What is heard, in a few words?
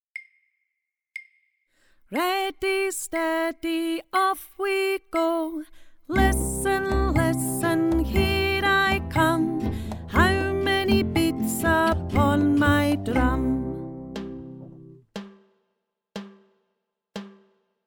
Listen with 4 drum hits